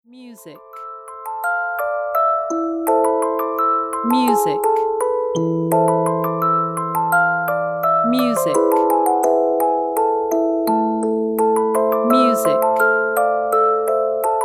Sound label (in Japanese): 物語テキスト動画BGM